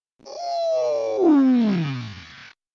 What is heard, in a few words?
oof